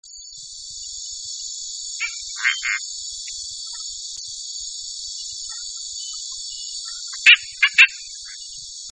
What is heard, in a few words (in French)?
chant fasciata; guyane